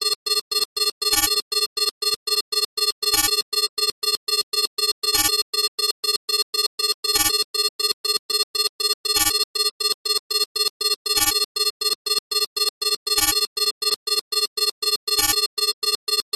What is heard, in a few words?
science fiction alarm beeps warning fast